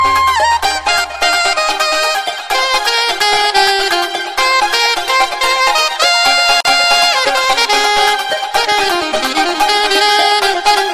Saxo

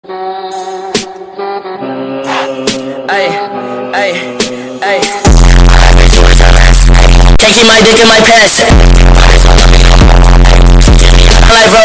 earrape

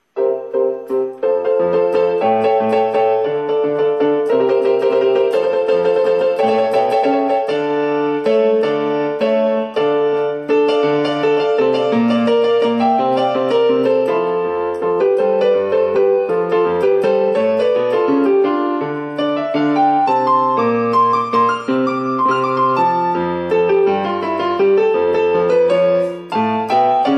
Piano improvisation

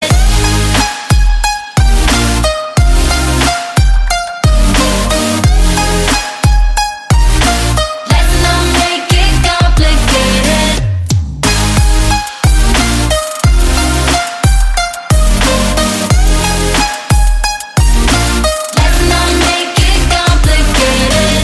Complicated ringtone

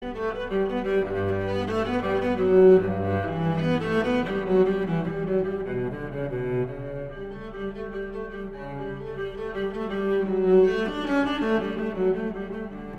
Bach cello suit 1

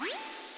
Codec; mgs

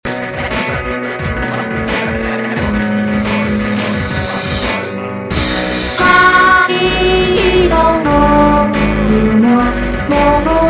vocaloid

